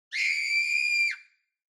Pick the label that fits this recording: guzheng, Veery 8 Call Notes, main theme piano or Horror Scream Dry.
Horror Scream Dry